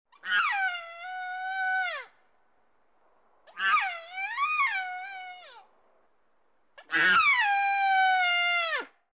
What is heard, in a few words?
Elk Calf